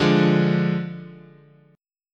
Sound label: D Diminished, guitar chord